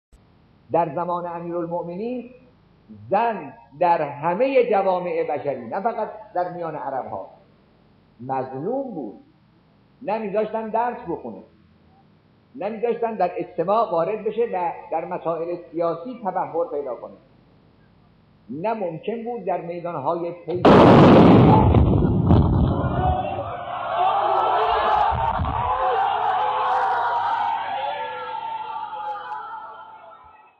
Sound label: bomb; Enghelab Jang